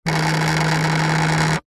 household mobile phone vibrate on plastic surface rattle